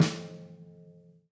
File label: Snare, acoustic kit